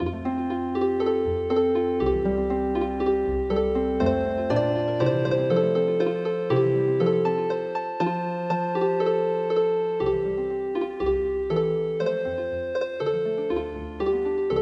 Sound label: Healing Harp